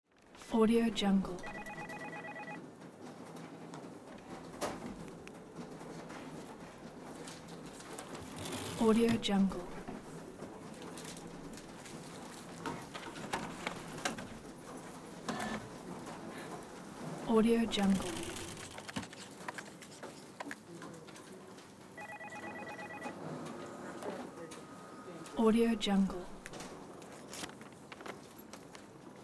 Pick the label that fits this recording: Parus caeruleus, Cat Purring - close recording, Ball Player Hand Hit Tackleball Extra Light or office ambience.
office ambience